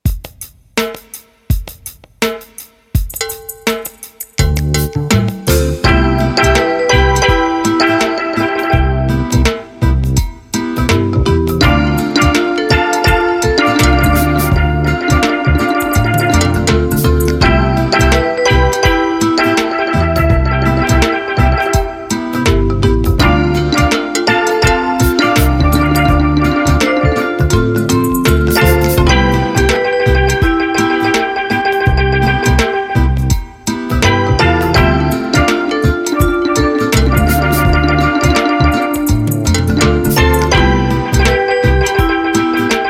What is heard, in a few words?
Lite Reggae Groove